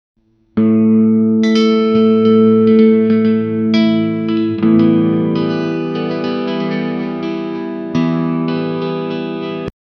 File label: sitar flourish